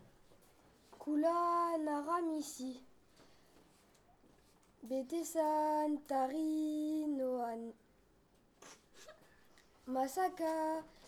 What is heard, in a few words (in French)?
impro enfant